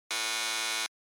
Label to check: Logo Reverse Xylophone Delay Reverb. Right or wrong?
Wrong